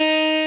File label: Arp. x 4